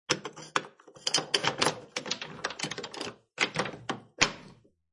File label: Door Insert Key, Turn Key And Lock; Miscellaneous Doors